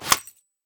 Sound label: rope, gren